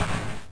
low crash; madcars2; radicalplay

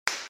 clap hands; 393.bz

